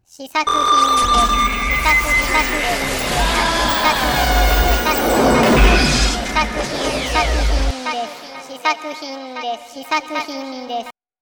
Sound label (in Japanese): 効果音